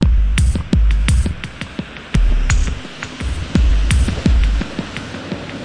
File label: eko kicks n noise, looping ambience